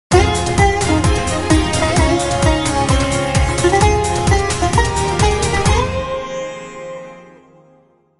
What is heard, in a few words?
Sitar